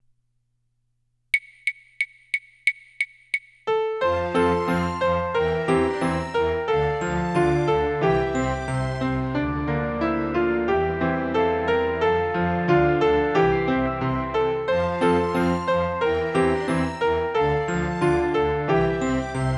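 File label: solo with accompaniment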